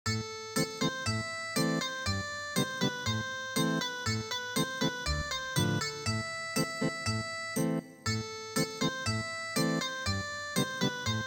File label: March Ethnic Guitar; midi; traditional